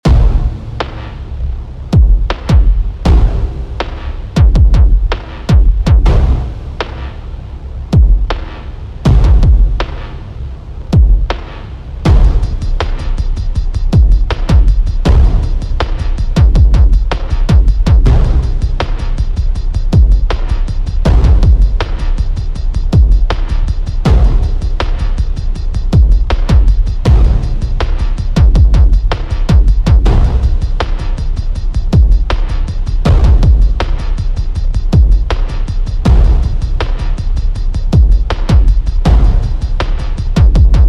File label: 80bpm